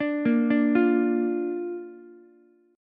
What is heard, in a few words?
Bb Major Bb down Ukulele, chord; arpeggiated; JGuitar